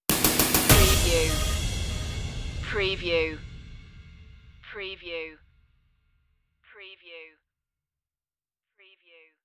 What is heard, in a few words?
deep impacter stinger